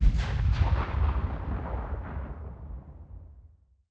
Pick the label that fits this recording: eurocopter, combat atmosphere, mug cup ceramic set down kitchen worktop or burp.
combat atmosphere